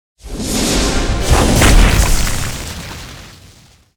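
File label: trap meteor; monster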